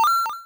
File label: MOVE; C64 Dreams; Multi-Sound Default